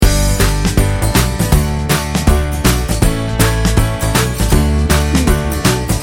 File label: happy hands loop